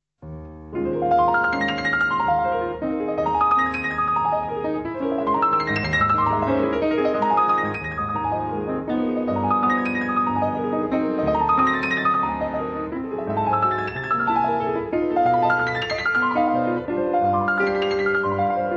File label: etude, piano